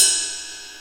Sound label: 808 KIT; ENSONIQ TS-10; Rubber Chicken Software Sample Bank